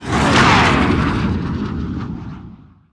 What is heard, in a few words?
Y-wing; vaisseaux